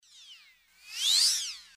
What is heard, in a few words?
magical zing